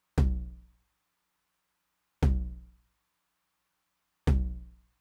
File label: Low Tom, Roland TR-909; Roland TR-909 Ableton Project